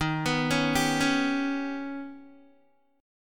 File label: Eb Augmented 7th Eb down Guitar; Eb+7 arpeggiated; JGuitar